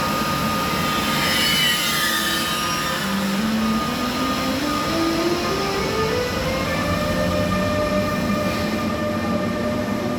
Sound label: Siemens ES64U2